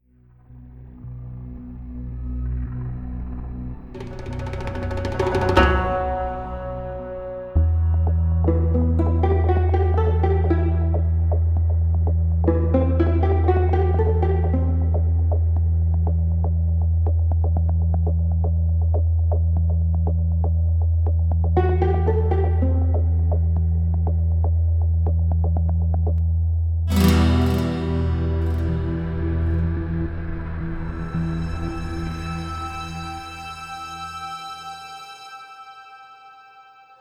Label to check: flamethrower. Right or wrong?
Wrong